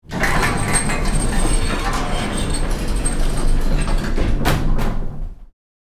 Industrial elevator operating arriving

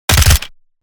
Spec ops assault rifle suppressed fire

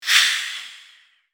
Vampire dark presence wind

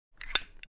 Zippo lighter open

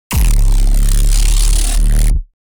Distorted energy beam power blast

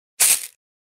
Shaking bag of coins bronze

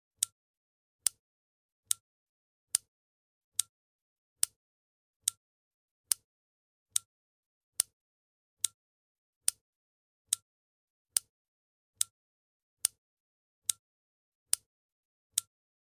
Stainless steel clock ticking

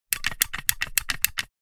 Silicone sealant gun repeated trigger presses; hand tool

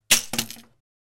Mouse trap snap

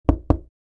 Knocking on a wooden surface or door

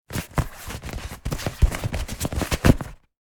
Inflatable armbands handling friction

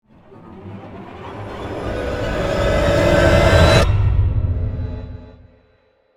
Horror cinematic string riser

